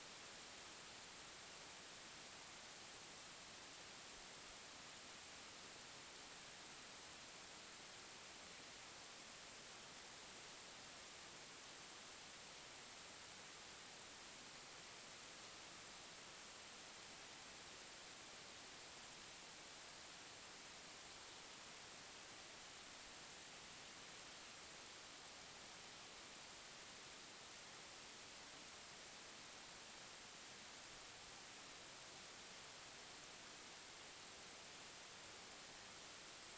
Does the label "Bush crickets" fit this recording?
Yes